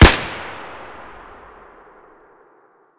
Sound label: sks fire close; Escape from Tarkov